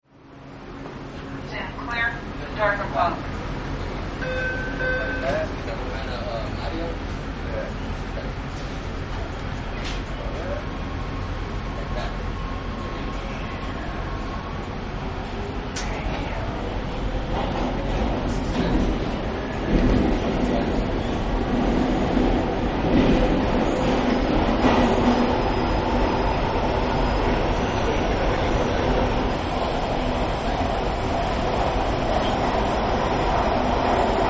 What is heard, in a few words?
Subway Ride Interior, Subway Ride Interior Recording, Including Pa, Small Talk By Passsangers; Subway Ride Interior Recording, Including Pa, Small Talk By Passsangers And Subway Track Screeching